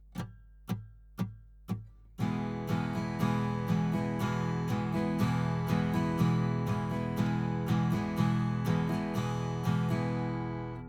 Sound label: Strum 120bpm